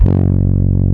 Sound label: HR G1, ANA HRN